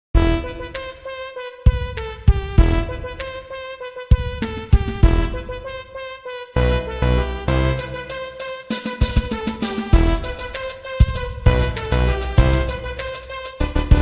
Level Track; block breaker 3

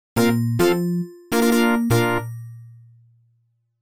Wave Complete; Off the Wall

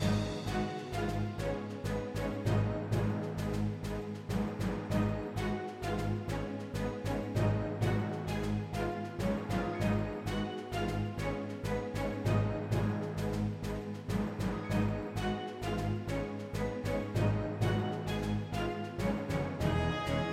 music battle; Sentry Knight Tactics

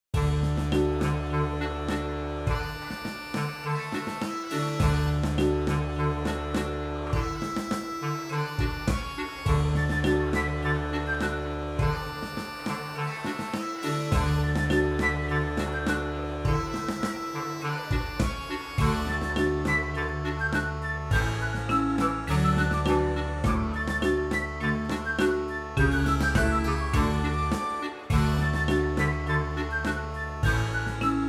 103bpm Reverb; 96beats; Tall & Short

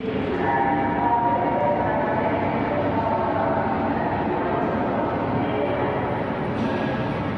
Ambience; Grimrush

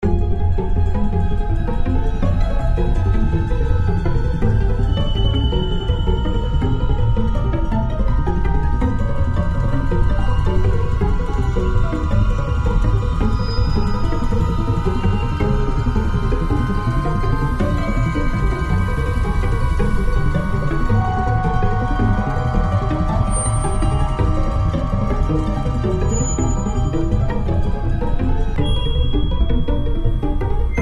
Action Full (Loop); The Walking Dead: The Telltale Series

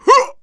clown throw, clown dodger; Ringling Bros. and Barnum & Bailey; Wii

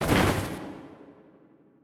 Teleport Out; Grimrush

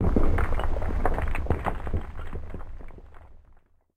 Rumble Rock One; Puddle; puddle plus ios gamerip 2018

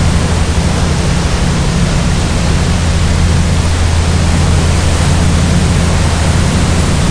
Boat cut

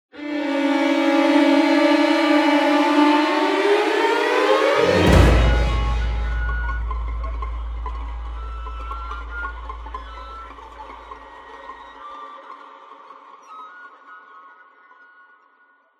Short Music Crescendo; The Solus Project

